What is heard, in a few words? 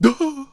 Healer Death Vocals